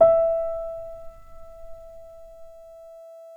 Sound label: PNO PP; Synclavier Sampler Library